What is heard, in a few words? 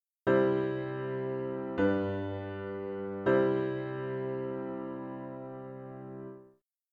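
piano, dictation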